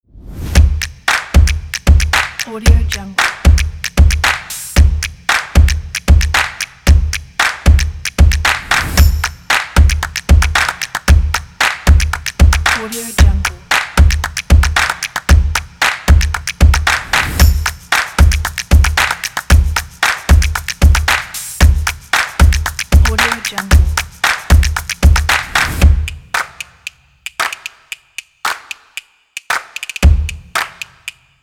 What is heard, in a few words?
drums kit